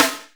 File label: Snare, Akai MPC-500; Toolkits; Snare TKit